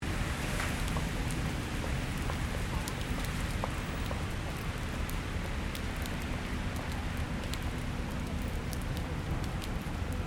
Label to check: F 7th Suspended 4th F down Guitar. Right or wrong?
Wrong